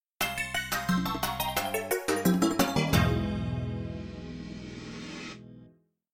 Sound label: jingle